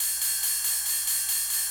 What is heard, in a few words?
Ride; acid loops; Wiretripn